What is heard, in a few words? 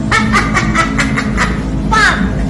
hahahaha